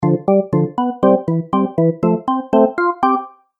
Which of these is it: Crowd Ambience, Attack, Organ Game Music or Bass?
Organ Game Music